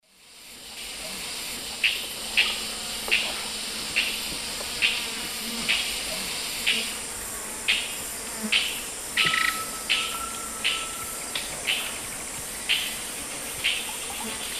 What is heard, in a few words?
Chloroceryle amazona, calls; S. America; Eastern Lowlands